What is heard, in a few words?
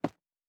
Footstep Concrete Running